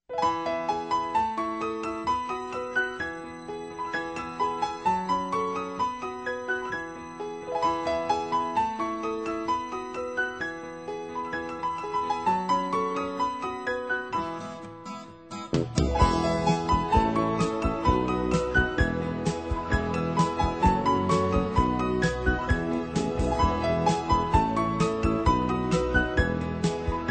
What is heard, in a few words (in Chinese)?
彩铃背景音乐